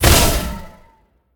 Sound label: projectile; smite; god tiamat